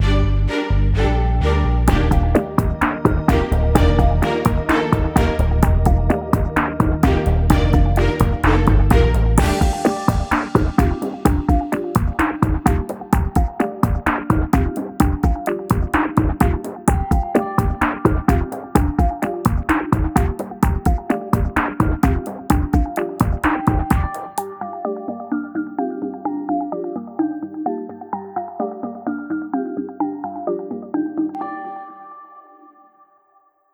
tension; by burak sound bank; tension gerilim vol 46

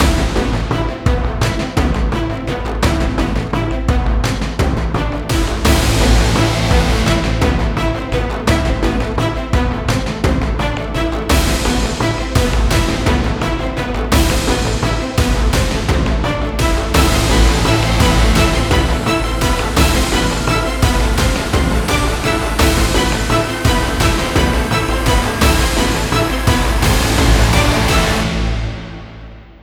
cinematic; by burak sound bank; tension gerilim vol 52

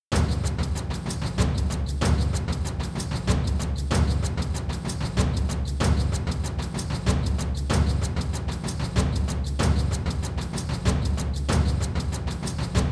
drum comp cinematic; gerilim; By Burak Sound Bank; tension vol 52

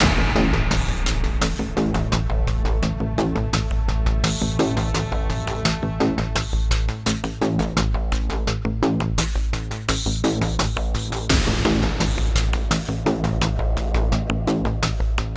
nostrings cinematic; by burak sound bank; tension gerilim vol 52

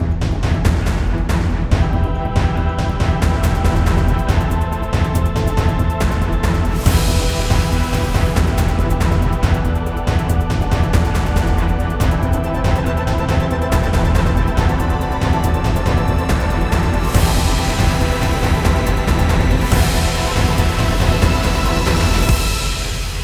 tension; by burak sound bank; tension gerilim vol 49

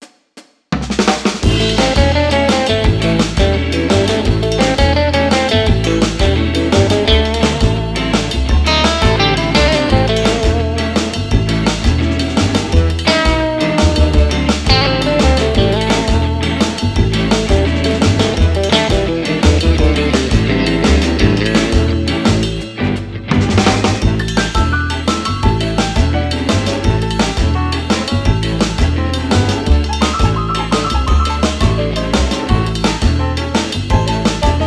cheerful entertainment dual solo; by burak sound bank; cheerful vol 8

